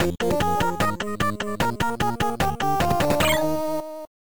Level complete; Alexio

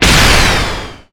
Enemy Roadmechanic Active Shot

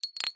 Browse Skin; subway surfers city sound effects; Android, iOS

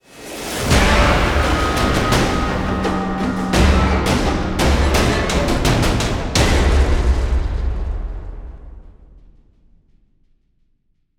Stinger Fill

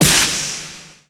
Normal Shot

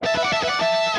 Guitar Cheer; ash adams gobsmacked

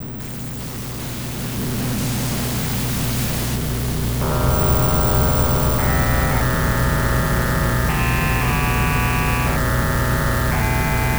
Boss BGM; Gemini Wing; X68000

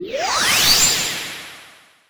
Enemy Waterplant Active Charge

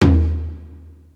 Taiko; Puyo Puyo Puzzle Pop; iOS, macOS, mobile

